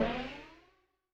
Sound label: TAMTAMY; Yamaha DTXpress-II